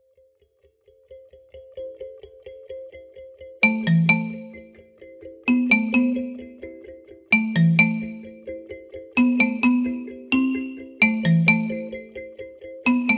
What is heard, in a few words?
rainstones